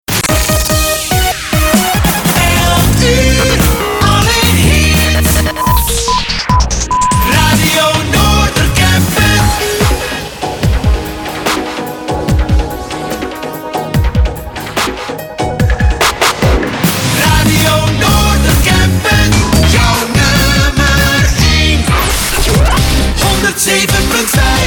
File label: Jingles